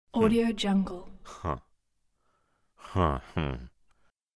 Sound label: male quiet hmmm